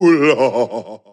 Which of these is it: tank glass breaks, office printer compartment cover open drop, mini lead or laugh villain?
laugh villain